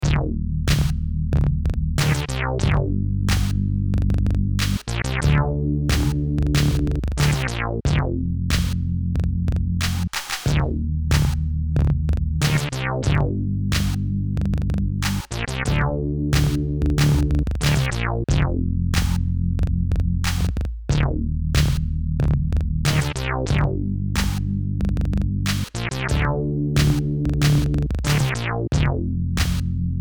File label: beat